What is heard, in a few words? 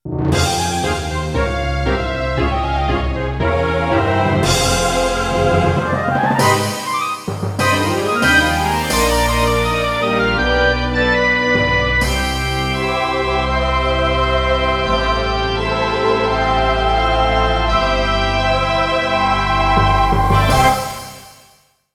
Wolf Ambush and Wolf Defeat; King's Quest 2 Remake